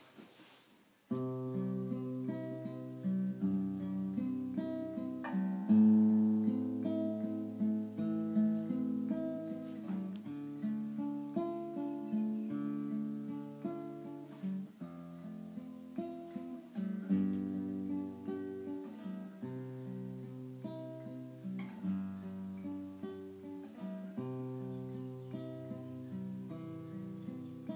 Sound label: Relaxation Training Guitar Only